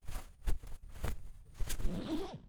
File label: cleansuit on; items